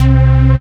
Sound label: Bass C, Offbeat Bass